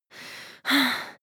breath female active aroused

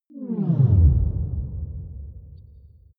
outer space shuttle signals

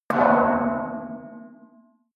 metal impact bar bell large

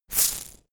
insect shaker hit single hard

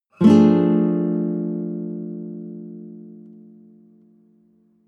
nylon guitar chord high diminished

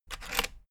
rotary control plastic rattle turn back

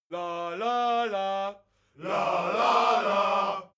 crowd musical bawling

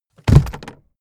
aluminium case hit deep

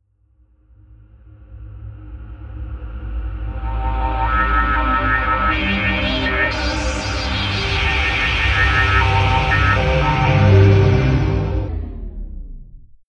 promo pad

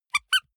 squeaker squeeze with release soft

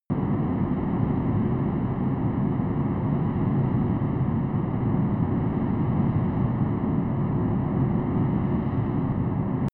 military jet fa-18 hornet turbine steady distant

